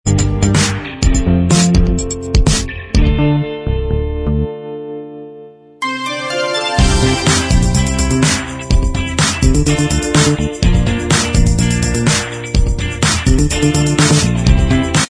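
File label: instrumental, track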